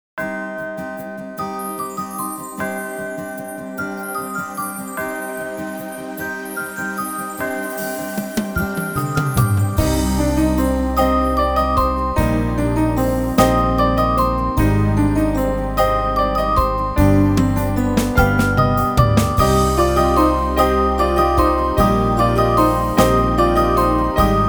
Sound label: Ukrainian Bell Carol FAST, piano; Sheet Music Solo/Duet